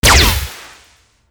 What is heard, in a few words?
Sci Fi Laser; SoundsCrate